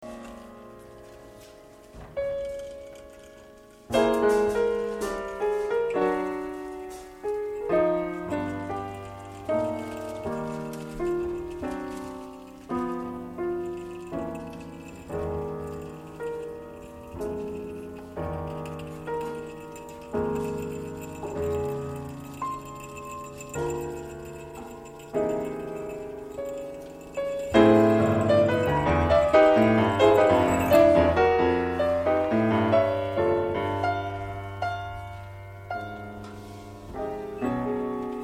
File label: Solo Piano (introduction)